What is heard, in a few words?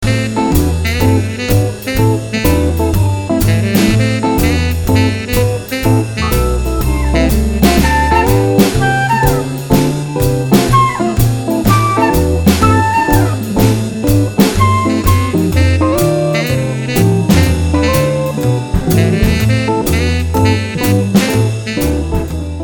jazz; songkits; Mixcraft